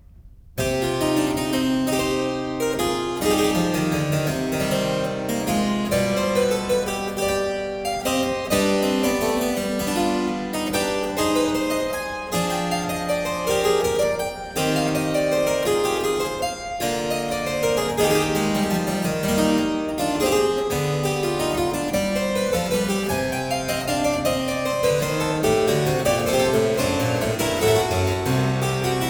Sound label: Prelude & Fuge in C sharp minor, Keyboard; No.4; BWV 849; J.S. Bach; The Well-Tempered Clavier Book I